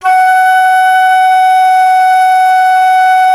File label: FLT, WOODWINDS; Roland - LA Composer vol. 1